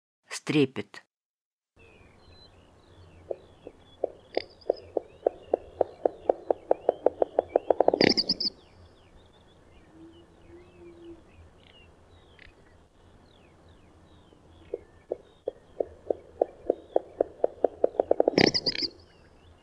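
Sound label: strepet golos, little bustard voice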